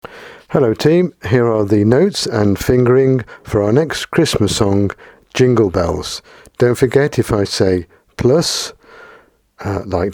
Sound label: Jingle Bells; Fingering